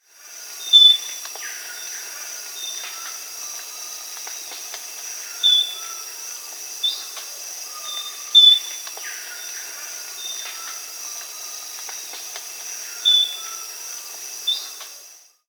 Fiery-capped Manakin (Machaeropterus pyrocephalus), Peru; S. America